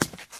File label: concrete step; Golden Crayon Sounds